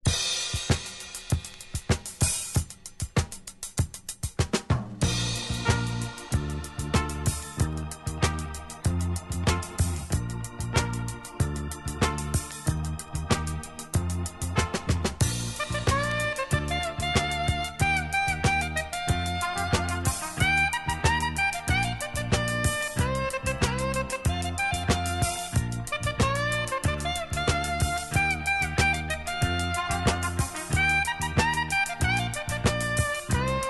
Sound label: Big Drum, Samba